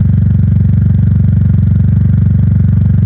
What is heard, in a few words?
Car Idle Loop; Nicktoons Nitro; arcade gamerip 2008